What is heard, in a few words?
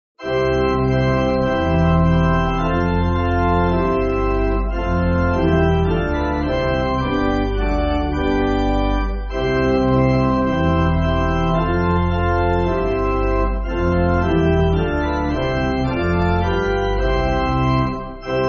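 Pipe Organ, Backing Files for Churches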